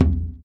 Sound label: surdo; ILIO; Ethno Techno